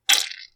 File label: Light Squash